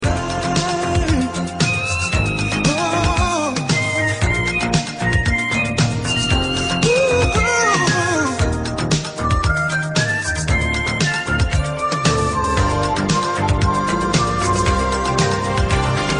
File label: yamaha dx7